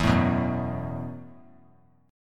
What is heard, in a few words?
E Suspended 2nd Suspended 4th E, down; strummed; JGuitar